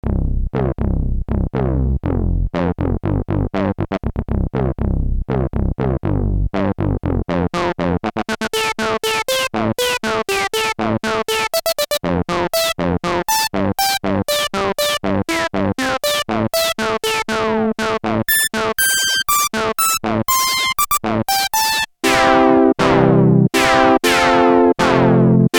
yamaha tg77 filter demo lpf

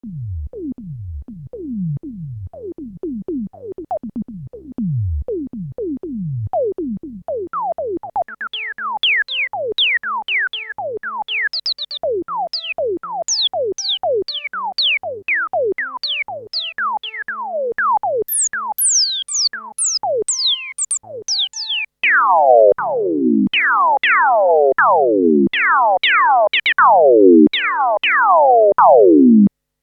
clavia nord modular kb filter lpf12 q100

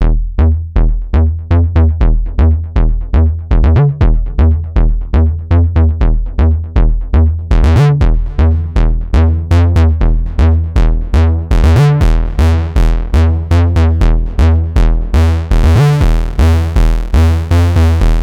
ensoniq sq-80 sounds pwm bass external fx

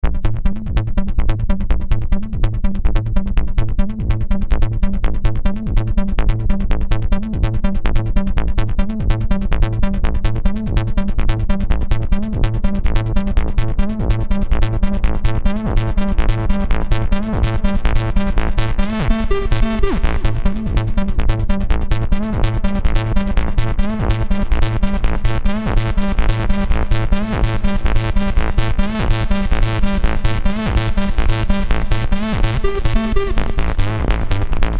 e-phonic invader vsti sounds external fx

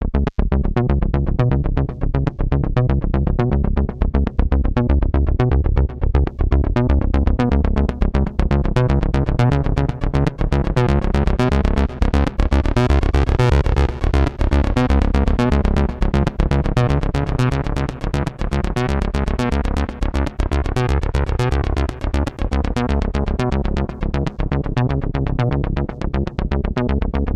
siel dk70 sounds, filter test external fx

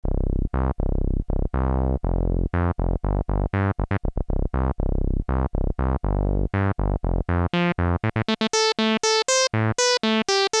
yamaha tg77 filter lpf q100

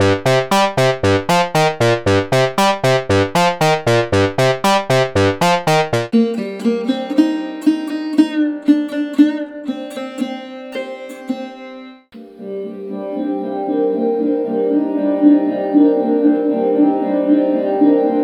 e-mu esi-2000 sounds, transform multiply

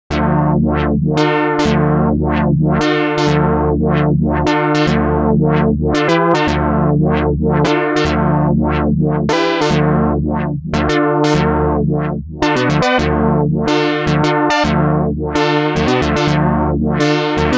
siel dk70 sounds, chords

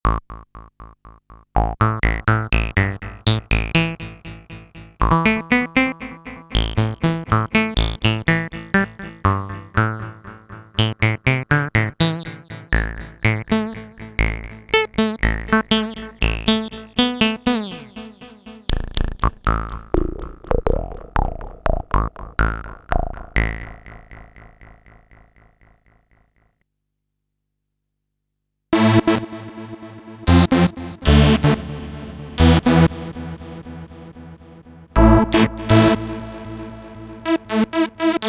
roland mt-32 sounds

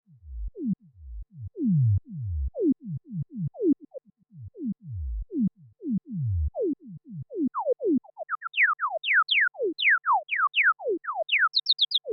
roland v-synth xt filter 2x dyn tvf24db ser q100

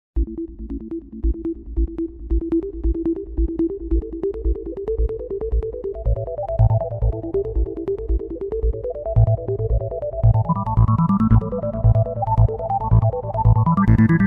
kawai k5000s sounds, formant

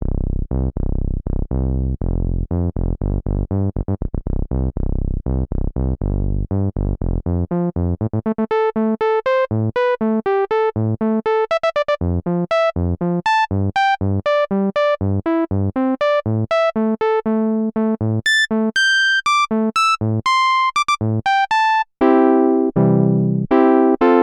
technics sx-wsa1r filter lpf24 q-100

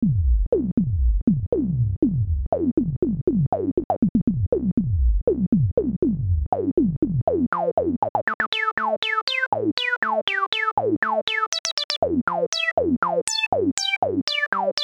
reaktor 5 vsti filter lpf ladder lp2 q100; native instruments

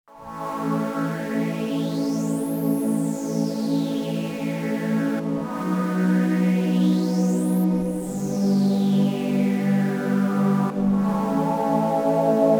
casio xw-p1 sounds, hpf solo synth 4x osc

